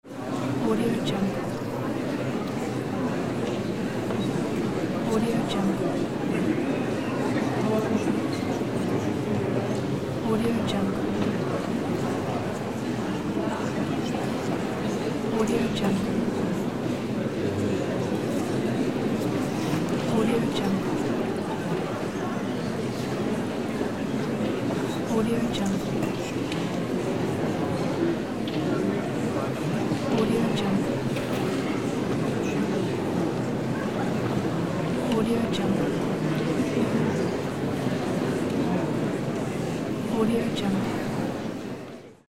crowd murmur

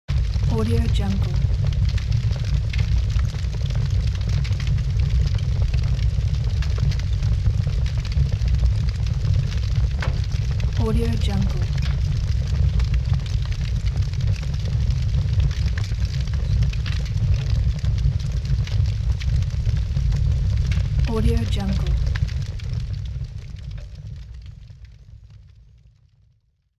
dark fire

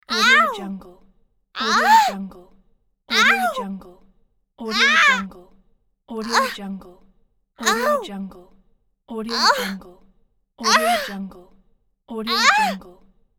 woman voice pain vowels ah

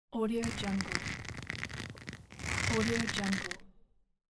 strangling grip

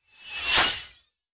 energy cloud whooshing by very fast

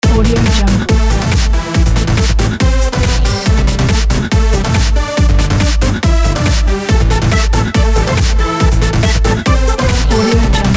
night disco mix; easytunes

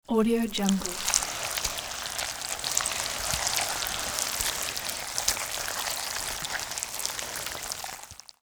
alien creature slimy slither

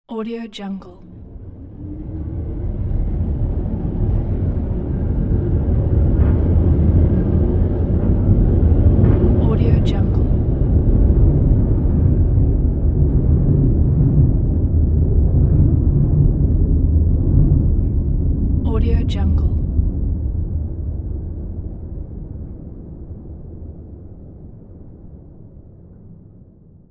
metro

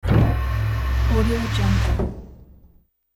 elevator main door open interior perspective; hollywoodedge

